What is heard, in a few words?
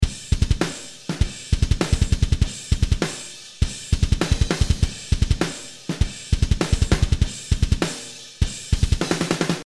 CC Trip Groove